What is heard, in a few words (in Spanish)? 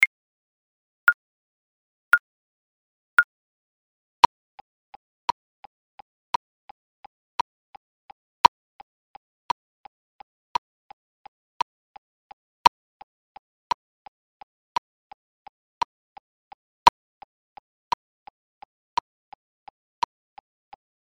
Respiracion 2 57bpm FSCH PTK